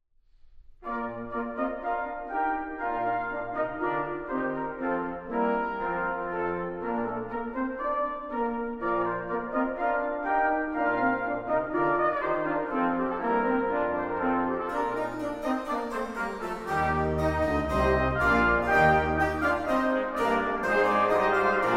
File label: Ancient Airs and Dances, Balletto, Brass & Organ